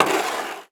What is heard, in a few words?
Weather Snow Shoveling Snow On Cement Short Fast; AdobeCC